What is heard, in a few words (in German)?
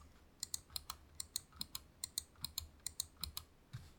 Schultertasten; Glorious Model i